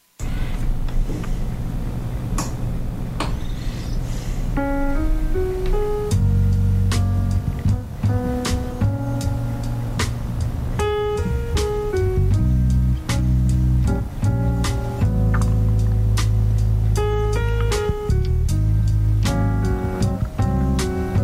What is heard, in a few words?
jazz